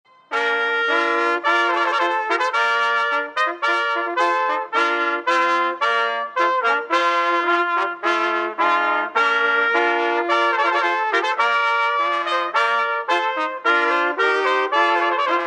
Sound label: Trumpet Voluntary